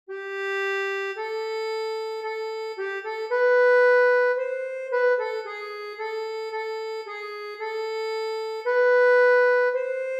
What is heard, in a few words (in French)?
ACCORDEON; DOXOLOGIE solennelle